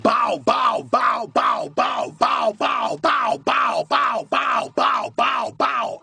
bow bow bow bow bow

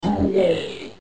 orc death; war1

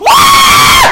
scream

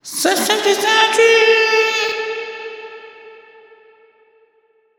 reverb

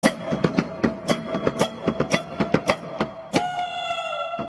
african wilderness

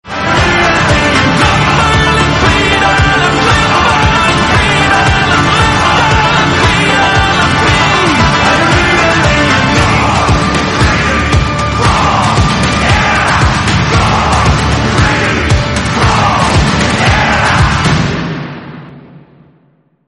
irish roar end

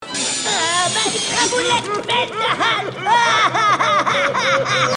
cry; eagle

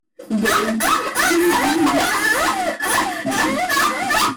stunning sound

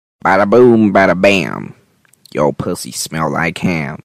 badaboom badabam

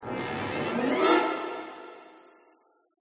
brass attack

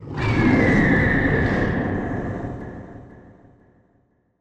griffon cry

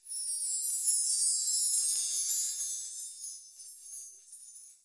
chimes

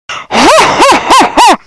evil laugh

